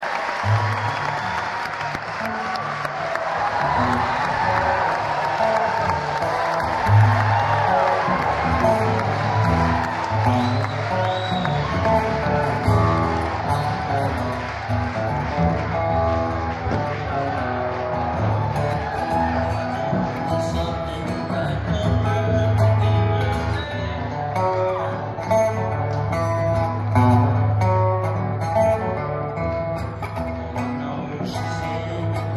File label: birdsong